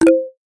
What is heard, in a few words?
Video Record, ui